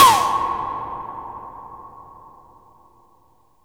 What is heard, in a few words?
TOM DIS REV, ELEC DRUM; USB Soundscan vol.20; Fresh Disco House I; AKAI